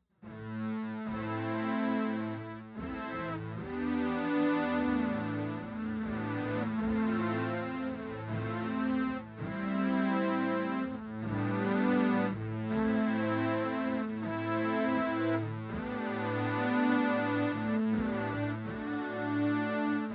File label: SAW PAD